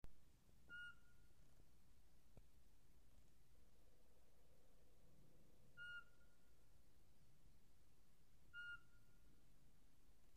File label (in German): sperlingskauz